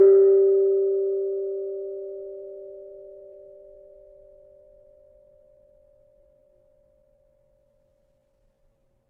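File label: Kenong 4 G, gravity harps tuned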